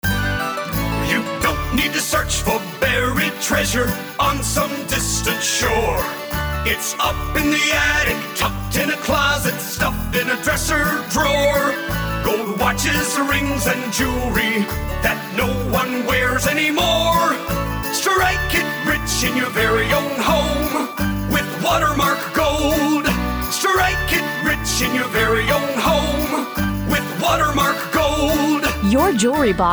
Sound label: jingle commercial